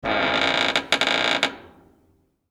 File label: metal door hinge squeak